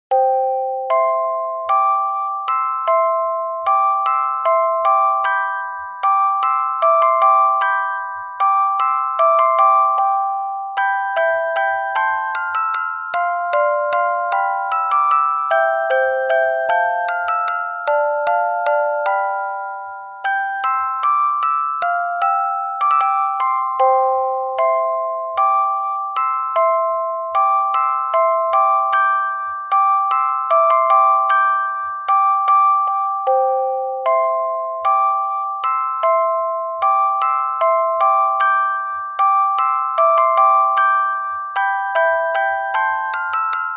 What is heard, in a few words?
hornpipe; handel